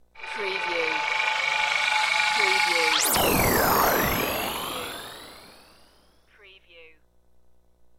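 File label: Space Ship Take Off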